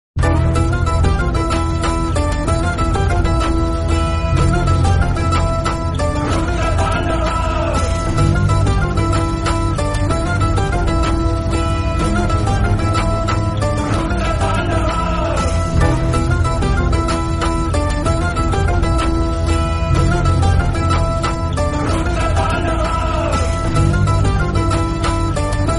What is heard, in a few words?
Bgm Hindi